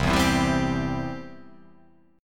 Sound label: C Major